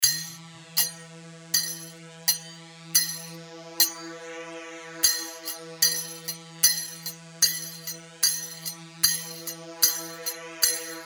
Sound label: Sagat; Sagat 2 صاجات